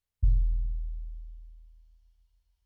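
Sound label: Bass Drum In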